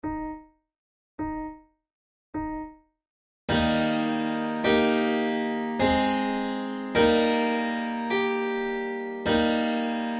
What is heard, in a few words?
cello sonata largo; marcello op1 no4 iii